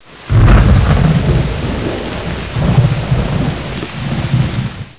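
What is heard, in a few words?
thunder, water; liquidwar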